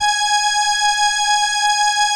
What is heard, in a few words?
ELKA, String Machines; ELKA RHAP; Best Service; XX Large Most Wanted 2 String Machines